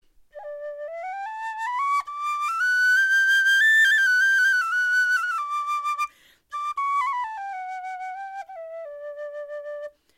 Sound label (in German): tinwhistle tonleiter